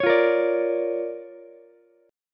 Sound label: D-Minor Major 7th Sharp 5th-D-down-Ukulele; DmM7#5 strummed; JGuitar